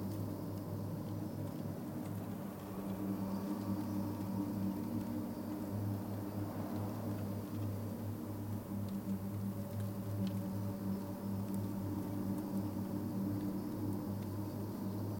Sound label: ambience base; godsofgold